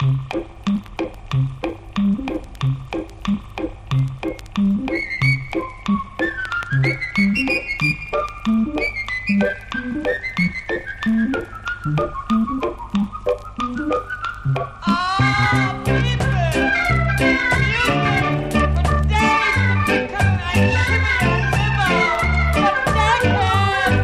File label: pipe organ; CALYPSO